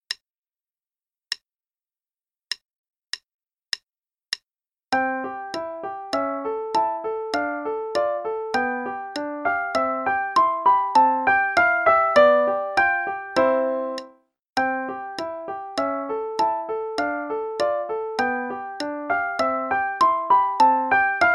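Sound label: Play-along, Dance, quarter note=100; Kuhlau; Scotch Dance No 1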